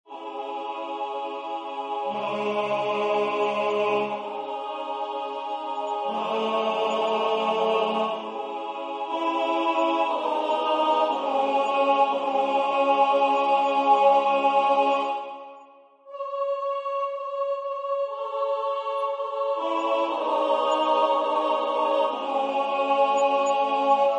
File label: tenor; Peace I Leave With You